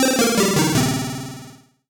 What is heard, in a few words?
Solo Chip C64 Negative; MUSIC EFFECT; startide